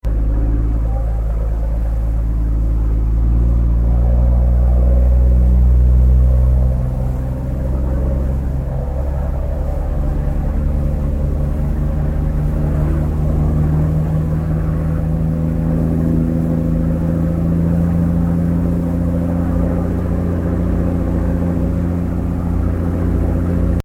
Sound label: Boat Tourist Ferry Accellerating Slowly; Boats; Vehicles